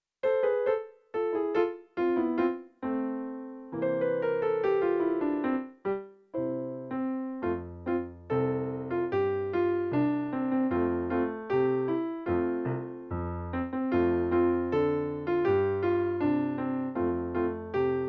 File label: Backing